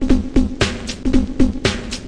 115 bpm tone hopper, drum loops and breaks

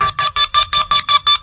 guitar 8ths, guitar loops

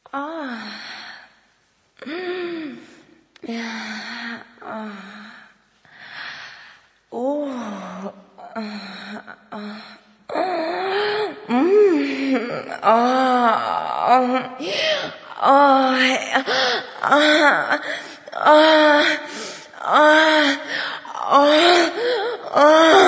fem fake orgasm then laugh; MISC and SPOKEN WORD

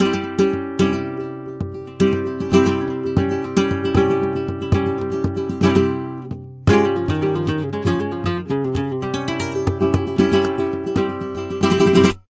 228 flamenco guitar; GUITAR LOOPS

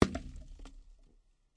snowball glass, Throwing Snowballs; UNUSUAL KITS and FX

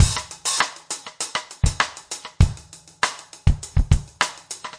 100 bpm, basic funk rim hats

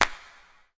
real verb real; clap; Claps

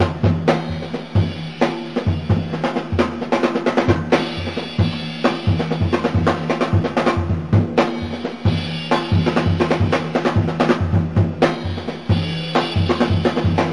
132 bpm rambling lofi kit

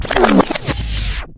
Electro FX; Ueb HE Electro FX

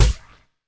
fx drum, Electro FX

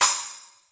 SPLASH, Roland TD 7; drum machines

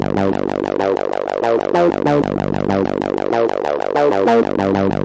190 filtery distorted bass synthline, bass loops

